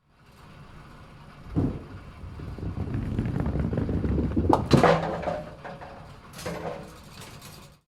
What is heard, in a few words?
bowling one pin